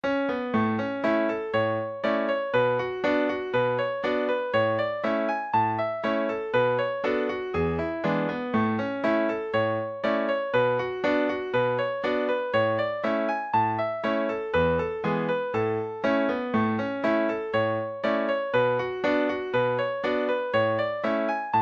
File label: hornpipe, session tune midis; ball and chain